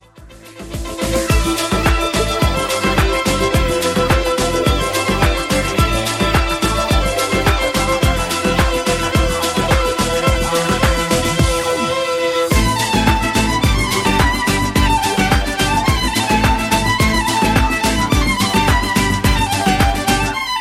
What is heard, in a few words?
wonderful music; Country